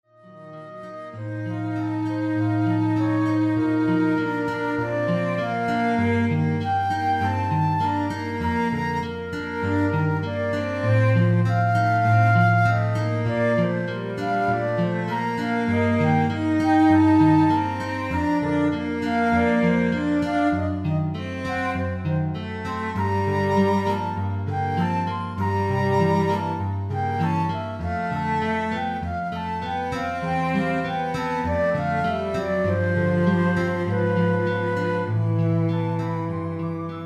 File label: Trio